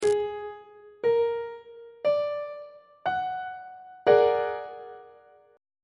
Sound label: b flat augmented 7th 3rd inversion on treble clef